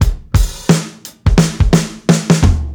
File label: SWINGY FILL, breaks, drums; Basement drums vol 2